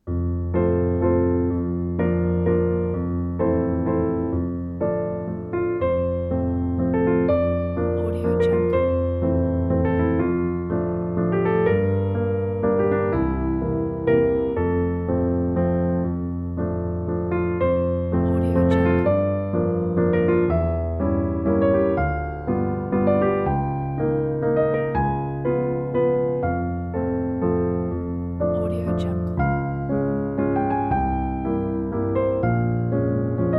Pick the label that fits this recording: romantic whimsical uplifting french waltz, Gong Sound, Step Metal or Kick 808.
romantic whimsical uplifting french waltz